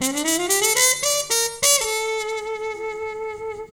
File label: BRS TPT; AMG - Now CD-ROM (Roland)